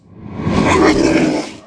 受伤, 鬼,幽灵; Enemy

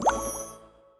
按钮类

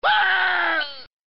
痛苦; Player

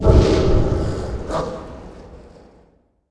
召唤狼; Enemy

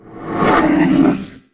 攻击, 鬼,幽灵; Enemy

